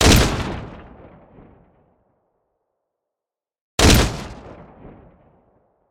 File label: Rifle Shot Artificial With Shutter Clicks Full Tail Dry